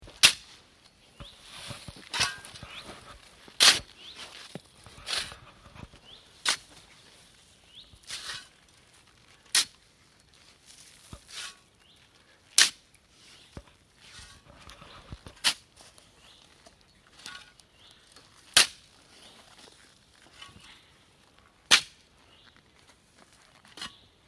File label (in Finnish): Hiekan kaivaminen